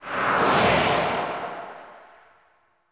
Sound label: Travel Whoosh Right; Reaper; Warframe; Wraith